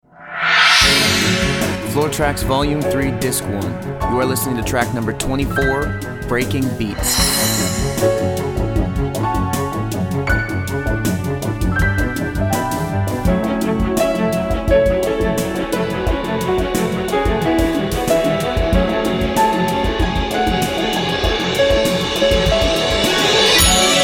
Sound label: Breaking Beats